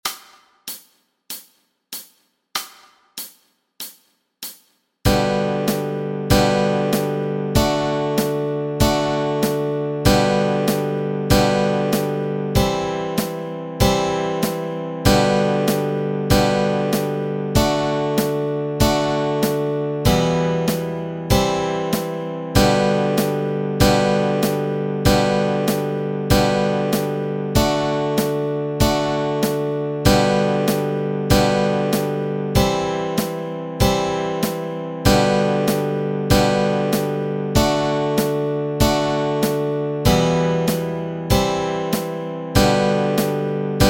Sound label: chords, Easy Guitar and Ukulele